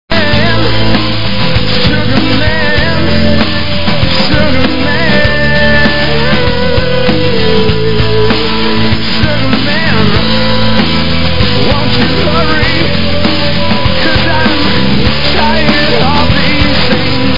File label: jinger